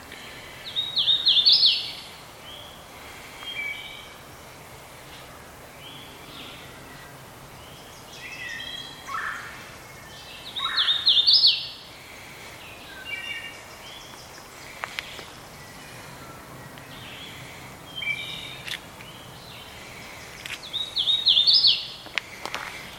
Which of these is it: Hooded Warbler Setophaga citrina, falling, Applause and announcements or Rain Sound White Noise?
Hooded Warbler Setophaga citrina